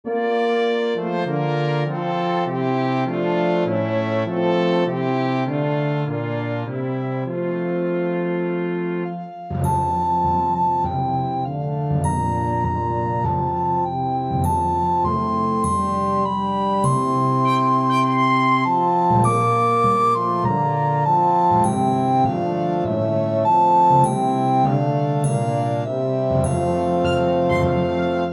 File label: Recorder Track with Melody; While Shepherds Watched